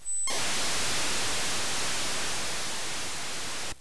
16 kbd

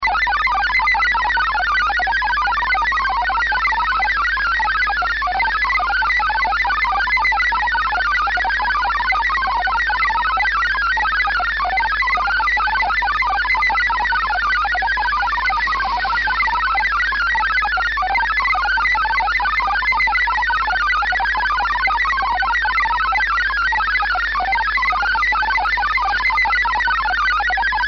multi frequency shif

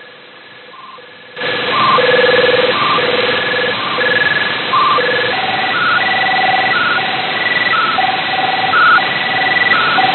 88khz

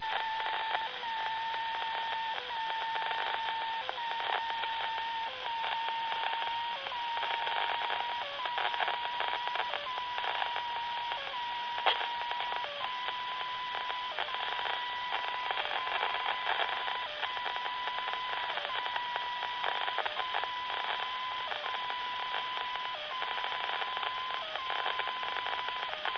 NFM

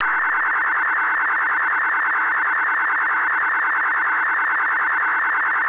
signal

